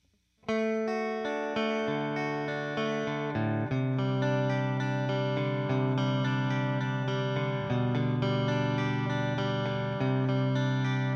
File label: strat